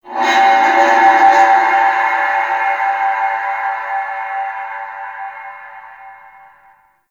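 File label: Shipyards distant metal bend, Ambience; Warframe; Grineer Shipyards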